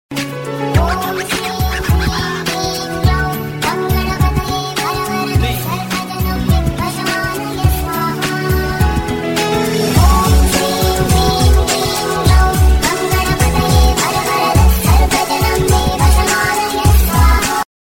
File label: Ganesh Mantra Remix